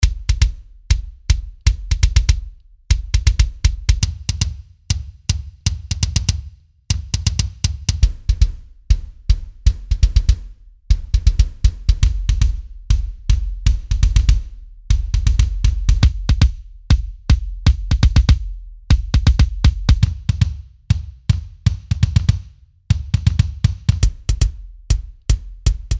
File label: metal kicks all